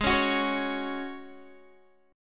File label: G sharp 7th Suspended 4th G sharp, Guitar Open G